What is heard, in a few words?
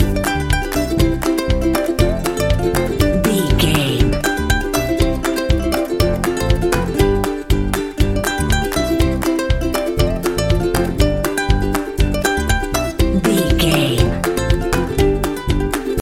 super happy fun loop